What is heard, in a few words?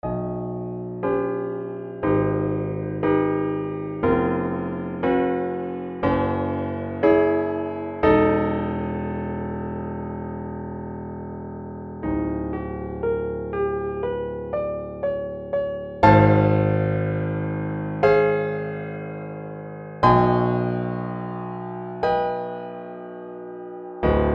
14 edo; micro